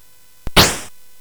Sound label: Space Gun